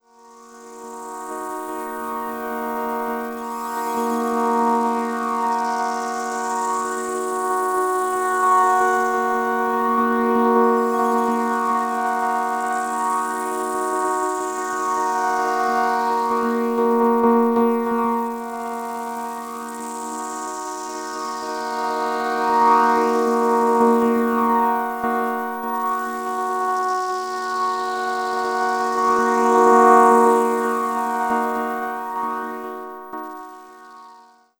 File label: Odd Atmosphere; Sonic Foundry (Sony Creative Software); Slow Silhouette